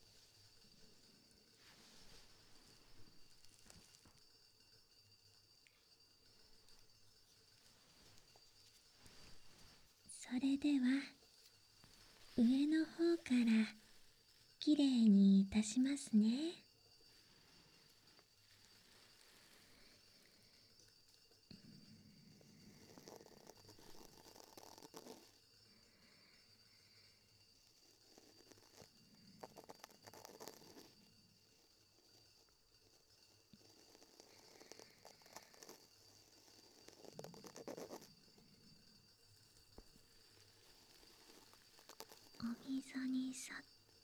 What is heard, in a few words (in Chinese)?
掏耳朵左, ASMR